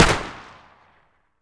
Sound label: mp5 fire; weapons; garrysmod